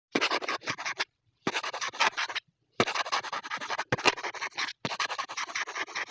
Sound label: Pen Writing; Vintage Cartoon; Foley